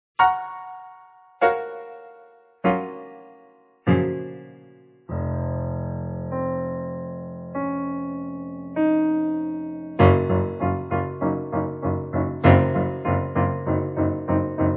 Piano Verb; PSP

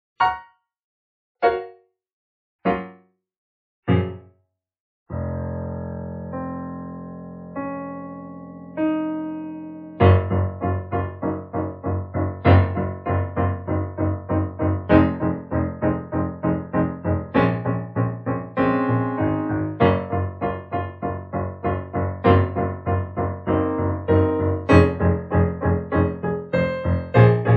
Room; RoomMachine 844

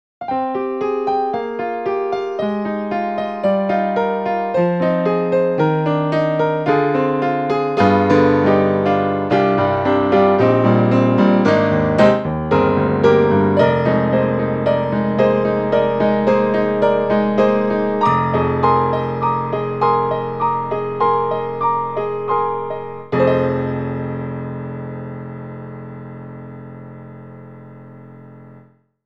Clavinova Piano

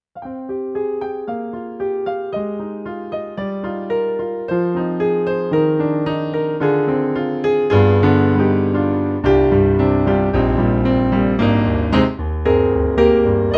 MOTIF Dark Grand

